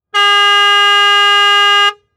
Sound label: Saturn Honk Horn Long; Auto; Transportation